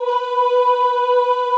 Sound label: OOH B3, Classical Choir; Best Service; Extended Classical Choir